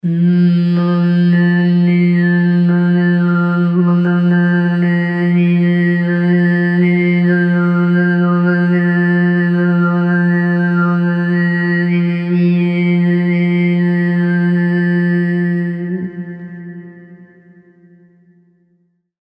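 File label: didgeridoo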